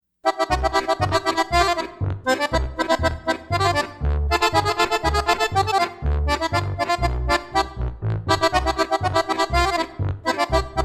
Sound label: Tejano Accordion